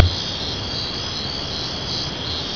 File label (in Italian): canto cicala